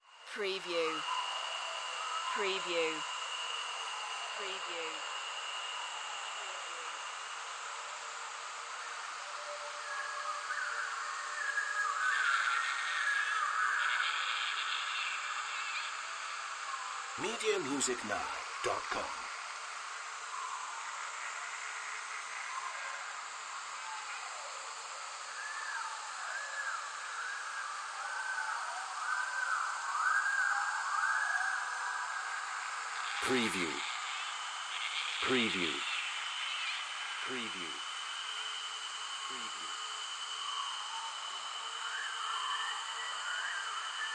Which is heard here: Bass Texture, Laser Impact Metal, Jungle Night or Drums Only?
Jungle Night